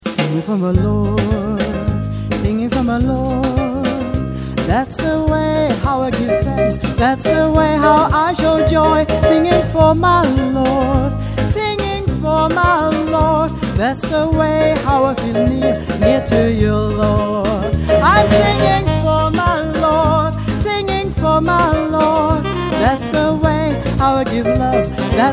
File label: gospel